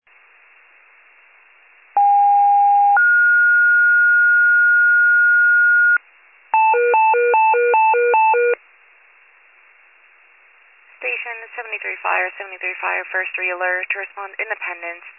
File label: fire, pager alert